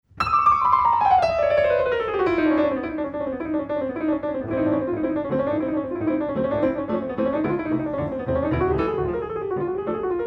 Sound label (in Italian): Classica Registrazione; Mito Torino; Volo del calabrone